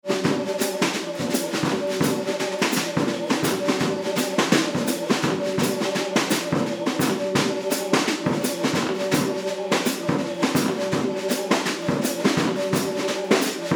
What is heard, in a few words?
Drums on Notes on Cuts